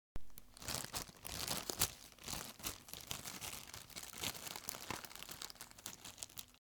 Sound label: Bread Plastic Bag